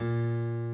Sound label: key; keySounds